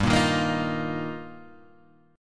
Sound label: G Minor 7th Sharp 5th G down; strummed; JGuitar